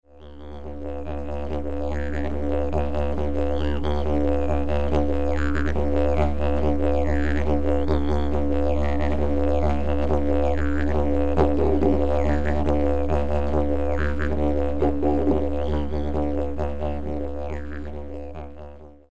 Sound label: D didge, Eukalyptus, Ton: D